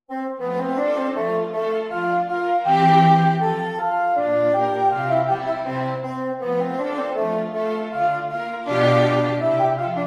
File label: Quartet